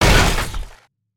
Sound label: Spider Boss On Hit; creatures mechanical